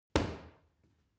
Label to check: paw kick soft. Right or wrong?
Right